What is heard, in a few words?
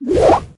ulti swing